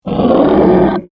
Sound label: Monster Groan, Horror, Monster, Groan, Creature